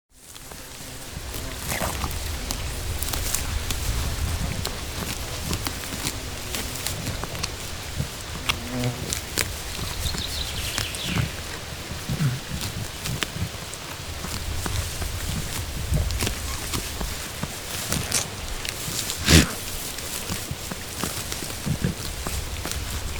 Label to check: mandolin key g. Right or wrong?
Wrong